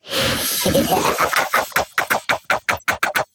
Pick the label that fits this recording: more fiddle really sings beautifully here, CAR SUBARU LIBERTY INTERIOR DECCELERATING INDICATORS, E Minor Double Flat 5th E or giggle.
giggle